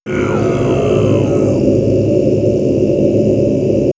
Monks, vox; Bardo Thödol; X-Static Goldmine; vox mix

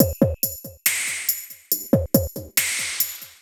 SloMo Beats, Loops 140 BPM

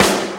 SD, Dance Mega Drums; Best Service; SD-HIP

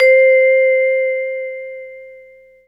VIBES C4; Greytsounds; Scott Peers Sound Engineering

